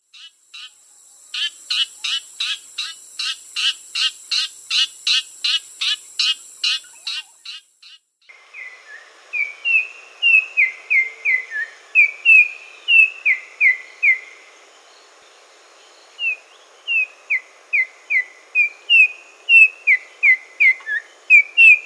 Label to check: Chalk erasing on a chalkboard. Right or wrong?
Wrong